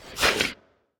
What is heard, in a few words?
grunt; fenrir